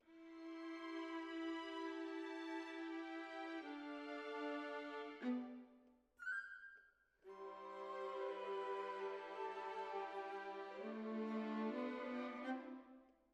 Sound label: Cellos